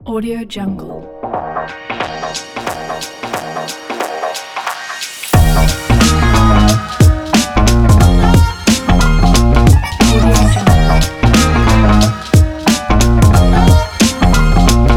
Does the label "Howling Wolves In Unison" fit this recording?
No